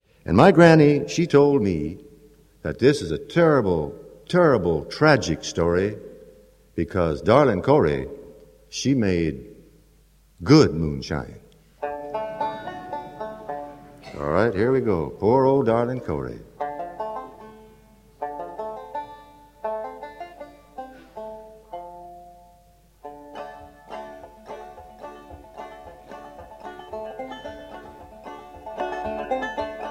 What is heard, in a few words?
banjo